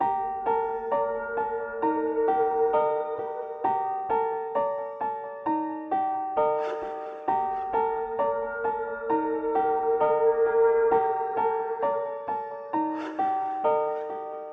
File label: 132 bpm G Minor, sinister music loops; Hes Coming